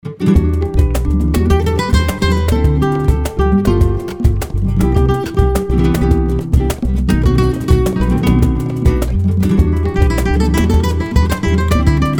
Flamenco Percussion

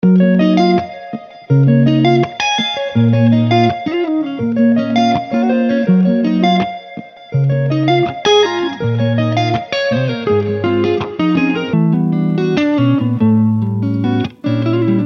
Ultra Lush Guitars

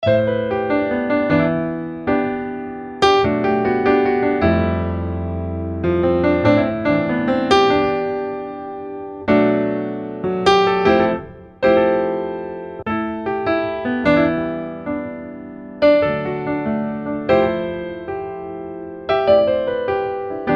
Jazz Keys

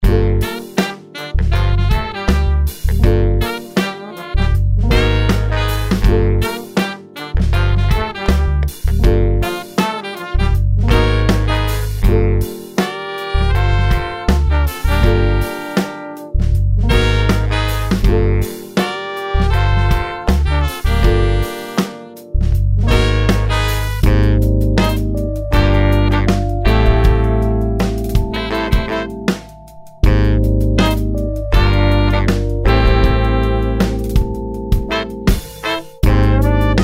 Funk Soul Ensemble

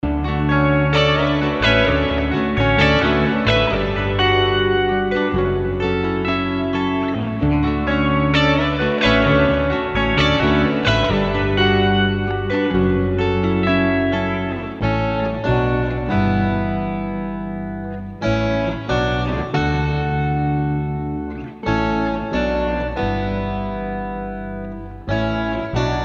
Stacked Indie Guitars

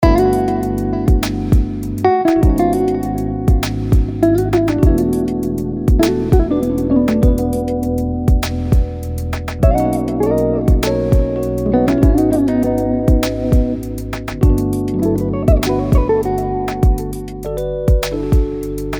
Smooth Guitars

